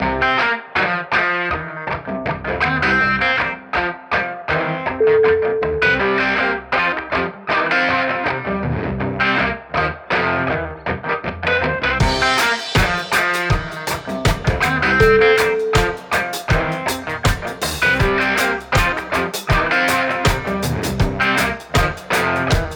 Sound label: blues smokers